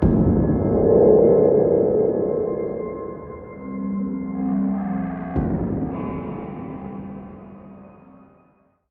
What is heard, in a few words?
Coop Lose